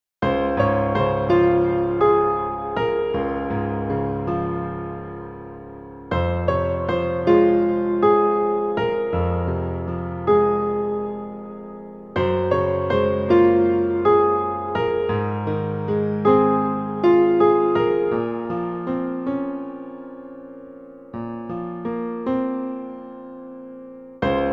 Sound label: piano